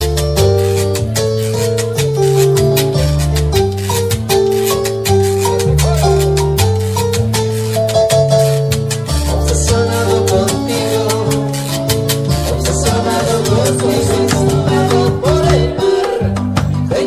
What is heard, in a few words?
colombian ringtone